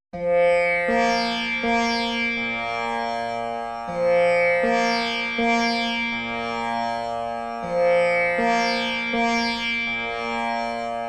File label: tanpura mid a sharp